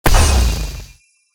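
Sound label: dark explosion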